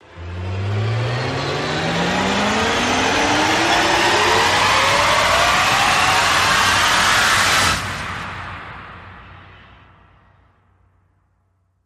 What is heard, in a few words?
Rise Short